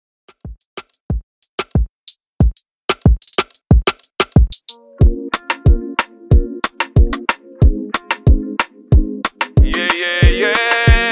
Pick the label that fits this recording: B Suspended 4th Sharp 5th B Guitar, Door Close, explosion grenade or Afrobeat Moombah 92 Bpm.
Afrobeat Moombah 92 Bpm